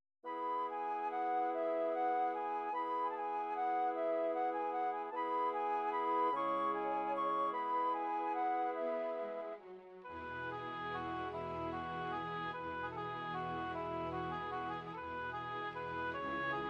classical, midi

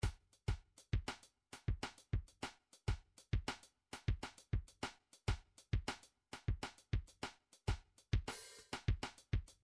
midi drums latin

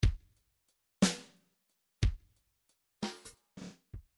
midi file fills 3 4 fill

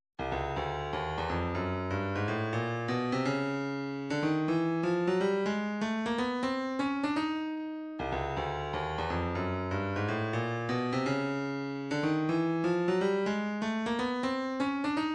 123 kick; Thumper

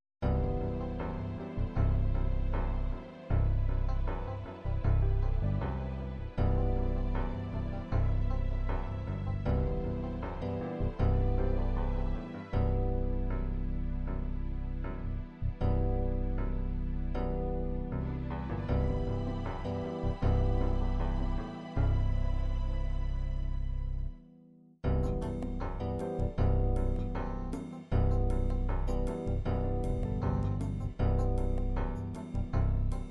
ballad

